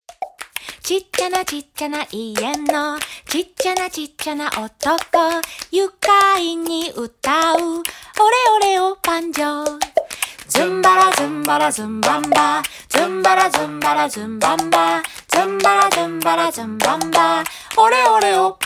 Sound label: banjo; バンジョー; フランスのわらべうた